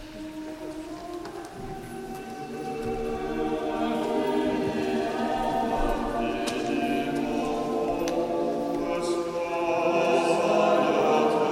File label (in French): chant offertoire quattuor